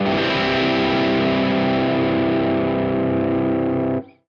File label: guitar chord; Summer Catchers